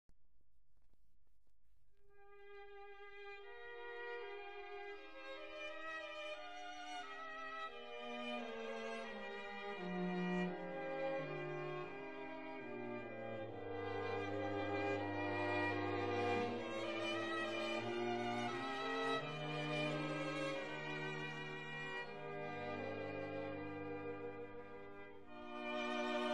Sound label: String quartet; Barvinsky Vasyl